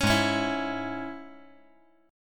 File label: G sharp Suspended 4th Sharp 5th, G sharp, down; G#sus4#5; strummed; JGuitar